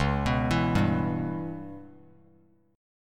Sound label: C sharp Minor 7th Flat 5th C sharp Bass Standard; C#m7b5 arpeggiated; 9,14,14,0; JGuitar